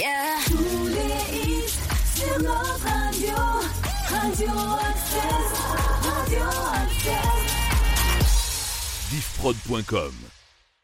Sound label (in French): Radio Jingle Chante Hits; Radio Access